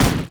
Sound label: ancient golem basic attack; monsters; LoL